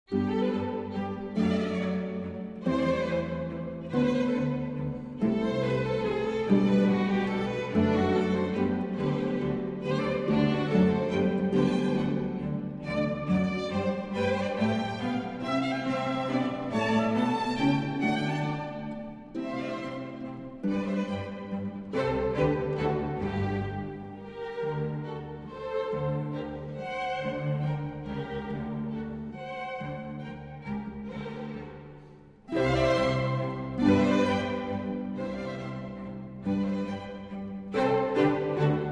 Concerto For Harp and Orchestra in G Major I. Allegro